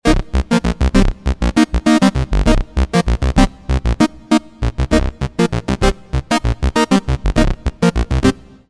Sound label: memorymoog funky